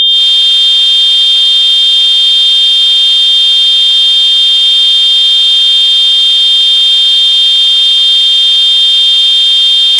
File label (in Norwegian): Baandbegrenset stoy + 3600 Hz + 3200 Hz; oresuslyder